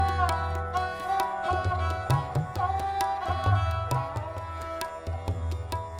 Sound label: Shivranjani, hindustani raag